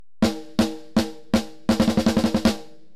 snare; Neural Q

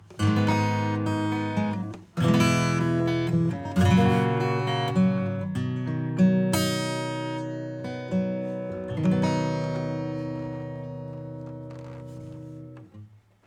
808 acoustic SUB; SubBass Doctor

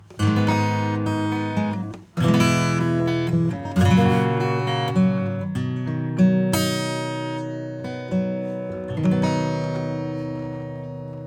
808 acoustic bypass; SubBass Doctor

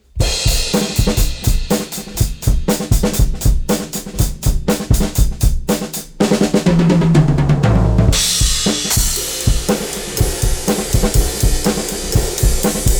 drums; Spectre

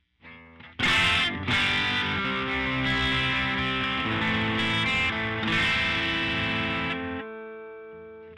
tube delay dry drive

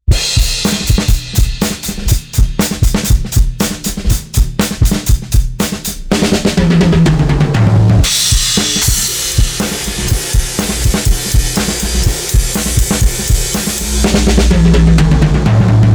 Drum SPREAD S weak; Gainstation

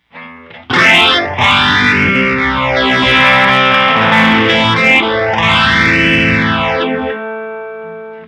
Instant Phaser OS feed 50

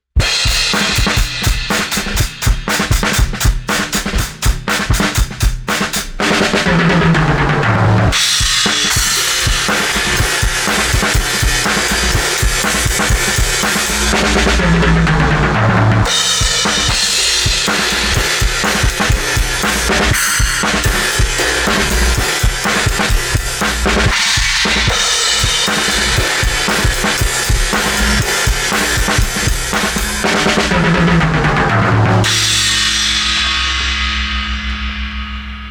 TAPE EQ Low Mid Boost; TAUPE

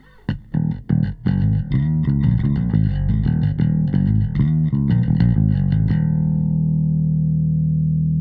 bass SLOW

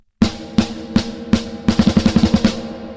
gate reverb decay; PHYSION

